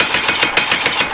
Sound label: chipper; quake2; coop; slaughter